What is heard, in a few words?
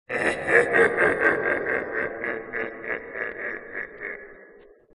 hehehe; taunt packs; darkness